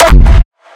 Gated Kick Triplet, raw hardstyle kick; raw hardstyle kick pack 1

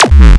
Strange Kick, raw hardstyle kick; raw hardstyle kick pack 1

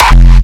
Kick, Raw-Hardstyle; raw hardstyle kick pack 1

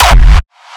Gated Kick, raw hardstyle kick; raw hardstyle kick pack 1

